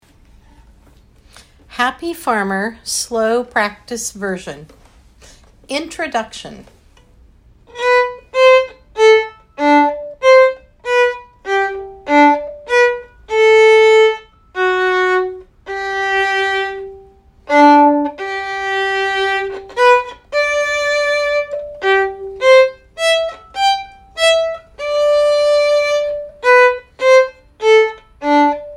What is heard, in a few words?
Happy Farmer slow tempo